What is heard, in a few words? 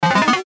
Chime Multimedia; Web Interactive; Chimes; Blastwave